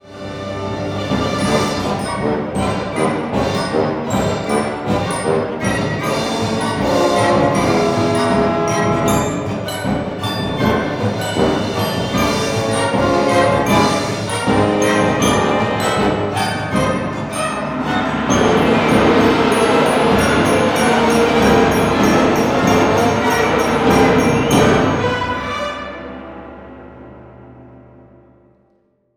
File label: Club Dance; A Bigger Splash